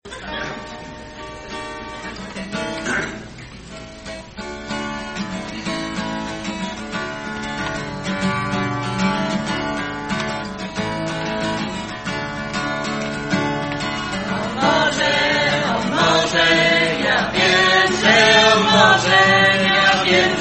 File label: Polish Hymn; Polish Missionaries